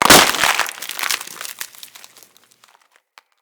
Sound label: Container Open; Plastids; StorageContainer; Material Specific Loot Containers